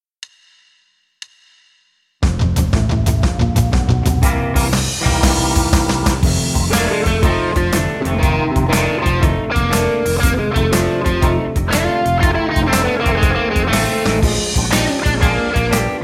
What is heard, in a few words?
blues